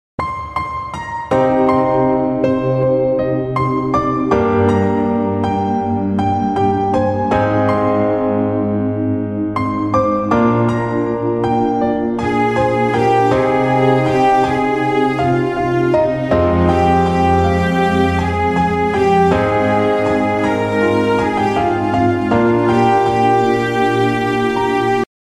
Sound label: Inst